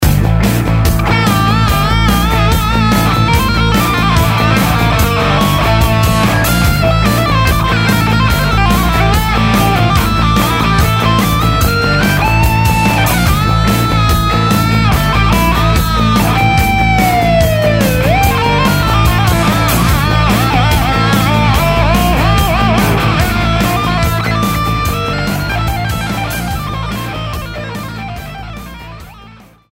A Phrygian Parallel; Parallel Modes; The Phrygian mode has a b2, b3, b6 and a b7 compared to the Ionian mode of the same root.

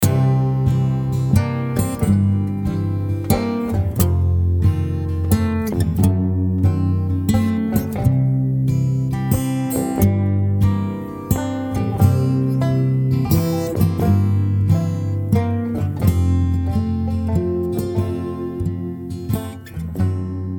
acoustic blues

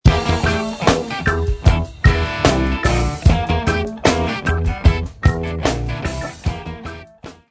locrian funk

